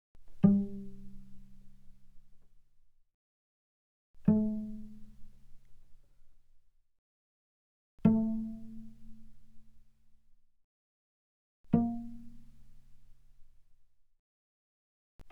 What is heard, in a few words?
Cello pizz mf sul G G3 G4; mono; Strings